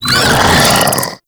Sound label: Desert Skate Vocal Attack; Warframe